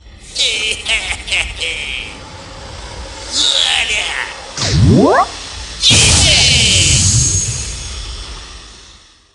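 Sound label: Boss Appearance; Super Monkey Ball Banana Blitz HD; Boss Toujou Hihi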